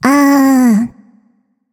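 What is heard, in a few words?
nonverbal seriously; smite; voicepack; izanami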